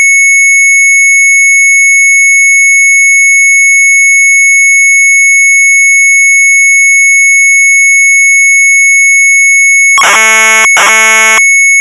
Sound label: MZ800 Tape; sharp; BARRICADE; retromelsarchive